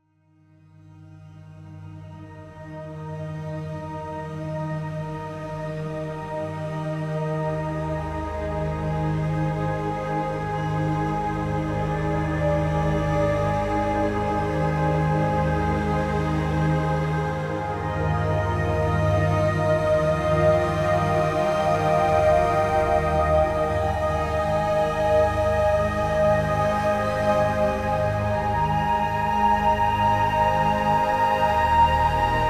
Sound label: Ambient Hybrid